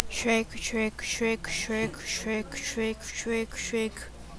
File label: shake